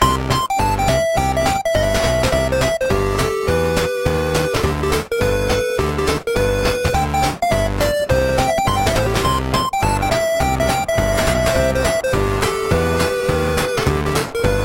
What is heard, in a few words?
Chiptune